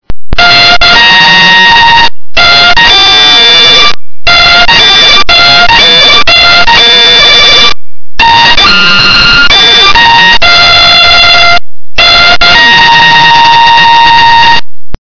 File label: taps